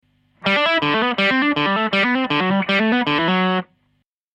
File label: open string, guitar